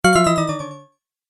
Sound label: Big Fail, Dong; Dong Sound Effects; multimedia